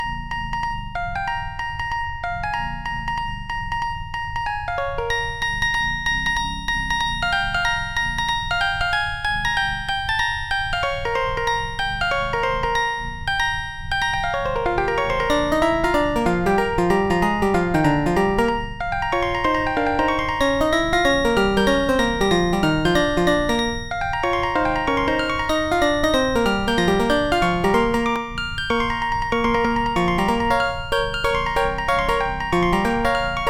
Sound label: jazz song; The Music Studio